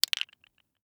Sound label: pistachio shell set down